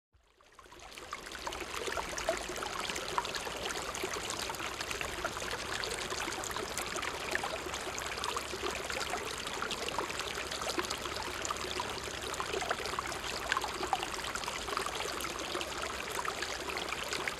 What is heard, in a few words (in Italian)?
SCORRIMENTO ACQUA